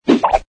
prop sort

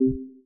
mouse Down; zhipai

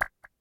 remove object; game; zuqiu

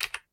click; zuqiu

